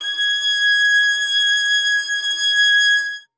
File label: string acoustic, cello